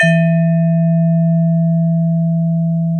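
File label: TONE E2, vibraphone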